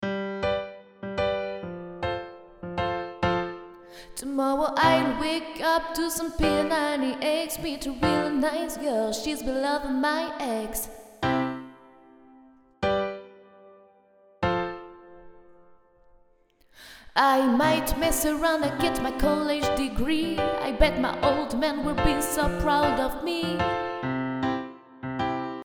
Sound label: soliste couplet